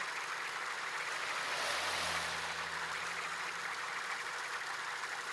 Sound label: Reel Spin; Victory Lane